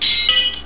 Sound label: ting tong, cartoon sfx